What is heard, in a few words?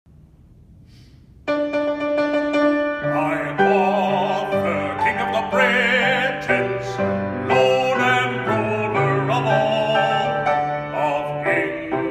song with vocal